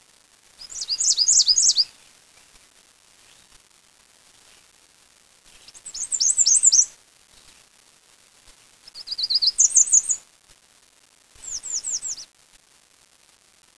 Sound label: american redstart, chants